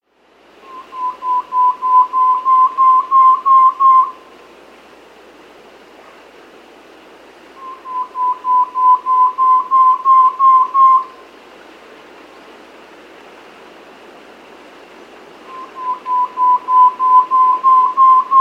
Brown-Breasted Antpitta; S. America

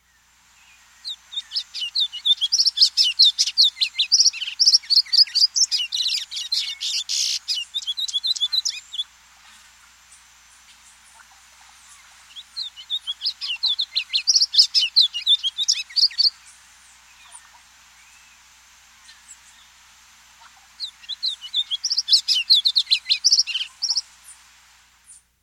Variable Seedeater, S. America

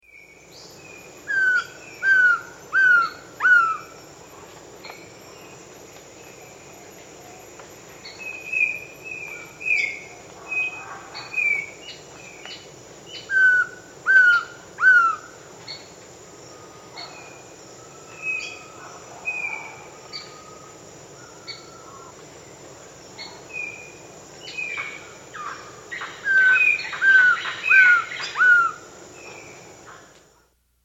Black-Throated Trogon, Nature's Songs, S. America

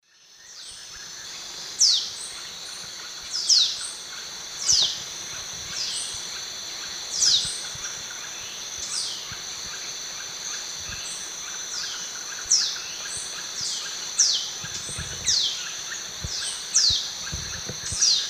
Lanio fulvus, calls pair; S. America; Eastern Lowlands

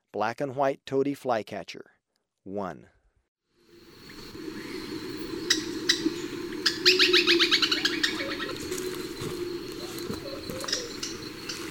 Black-and-White Tody-Flycatcher, Birds of Ecuador; S. America

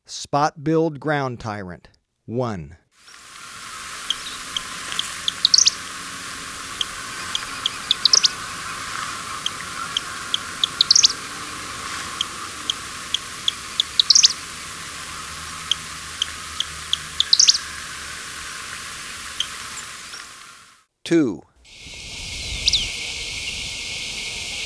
Spot-Billed Ground-Tyrant, Birds of Ecuador; S. America

